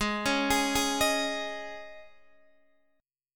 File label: Ab Suspended 4th, Guitar, DADGAD; x,11,11,13,11,13; arpeggiated; JGuitar